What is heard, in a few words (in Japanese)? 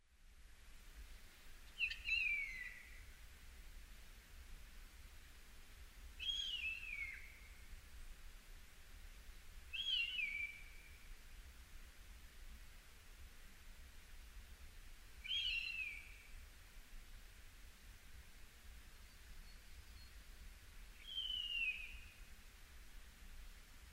nosuri